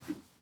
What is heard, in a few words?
throw; teardown; ps5 windows xbox series xs